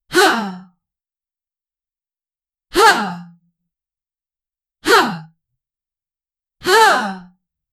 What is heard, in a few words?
a young female warrior ma, combat